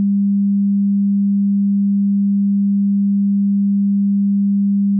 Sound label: 200Hz Mono